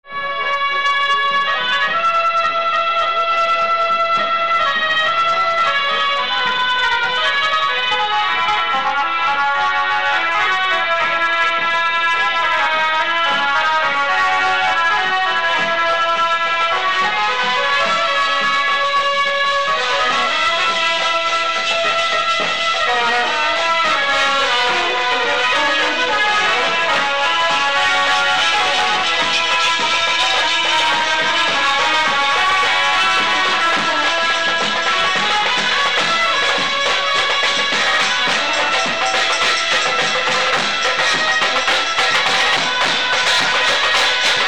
Wedding Party Music, Field Recordings From India Nepal; Jani Hirvonen